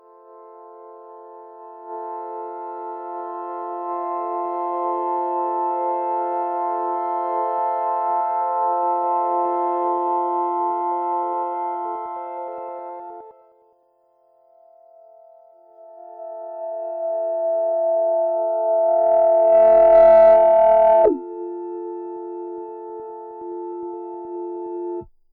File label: drone bell